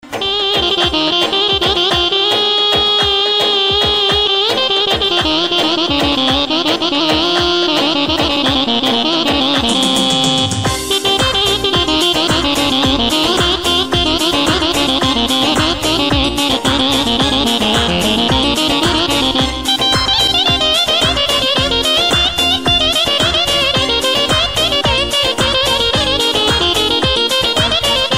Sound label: Tallava Instrumental